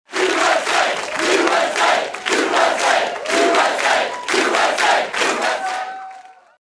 chant

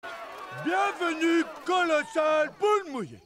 live alert

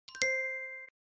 pinterest save sound

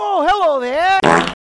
hello there fart

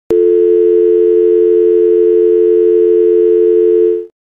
dialing calling

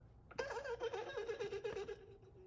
laugh

